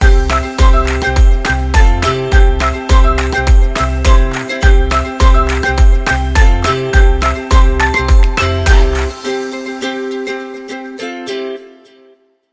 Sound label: Upbeat Folk Ukulele Fun; MIUI14 and HyperOS